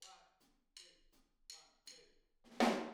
Take Your Toms Off Intro Room, Drums; Sonic Foundry (Sony Creative Software); Drums from the Big Room The Mixes; Demo Projects

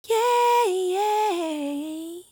"Yaah", vocal phrases; Ultimate Vocals

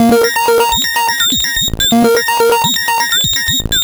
organica Bb 125, synth loop; Zero-G; Soundclash; Nu Dance Electro

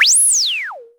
WILD, Dual Percussion Synthesiser; Klone

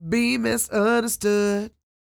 CROON, Vocal Blues & Soul MELLOW

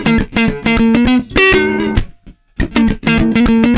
lick count; PlatinumPhattCD2; E-MU Producer Series Vol. 8 – Platinum Phatt

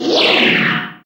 FX Dance; Sound & Vision - Gigapack II

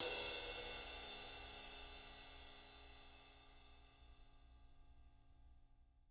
rides

